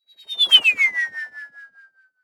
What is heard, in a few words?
science fiction ufo pass fast cartoon like; zapsplat